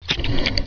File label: adrenaline